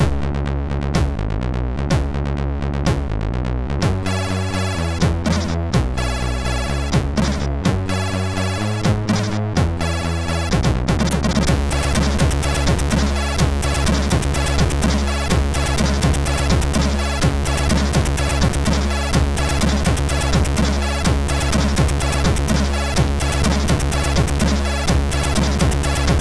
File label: Daybreak, MOS6581R2; sid